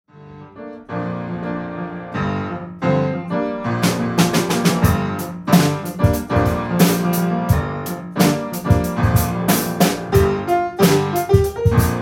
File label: Blues, Jazzcombo